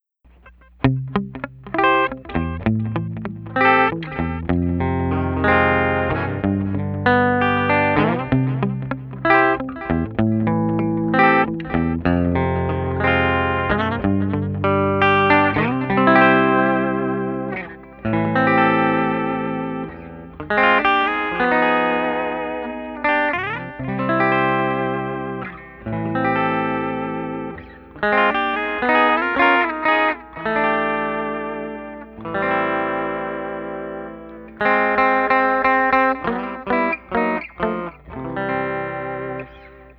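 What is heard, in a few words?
Bridge, guitar; Guild NS X350 Stratford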